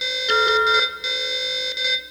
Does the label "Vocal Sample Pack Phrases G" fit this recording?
No